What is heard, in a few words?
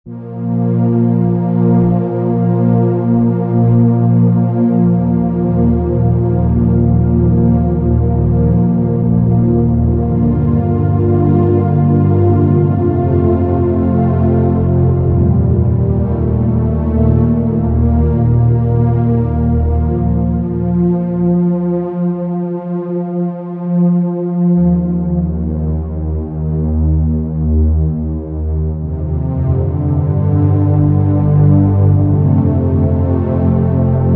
Underscore PAD; Worship Flow